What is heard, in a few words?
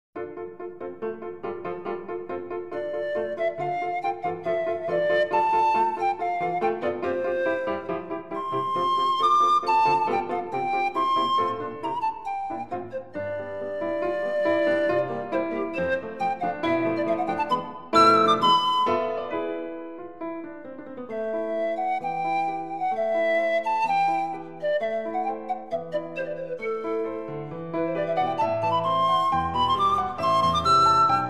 Sonatina Allegro moderato, Pan flute music